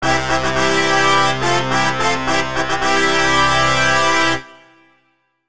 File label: Fanfare; Smeshariki Cartoon Studio